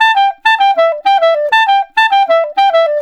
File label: Clarinet